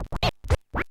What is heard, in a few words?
SCRATCHES